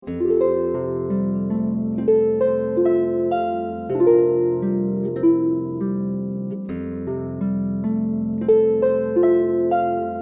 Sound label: Harp